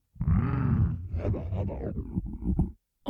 alien whistle; prosoundfx

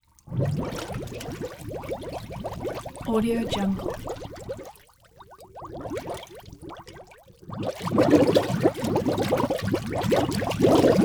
bubble glugs large well pool jacuzzi

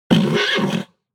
horse neigh agitated short; animal; smartsoundfx

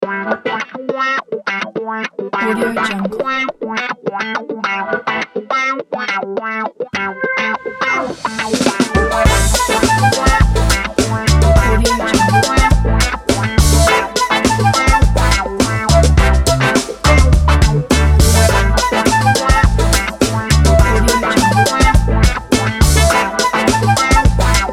uplifting summer funky tape beat

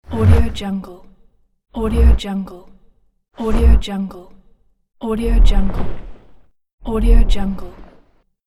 dragon wing flap pack

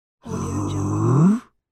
orc confused

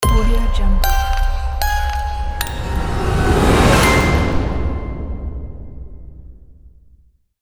dark horror logo

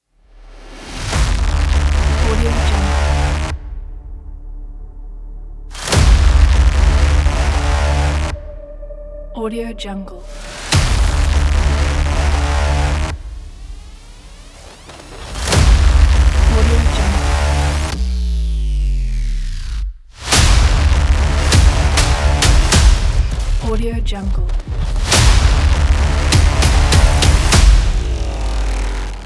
for aggressive trailer intro

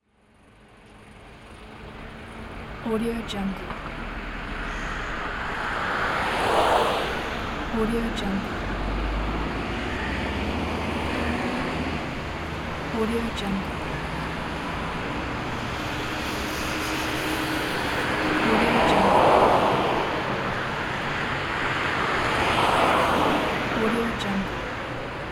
cars on the road

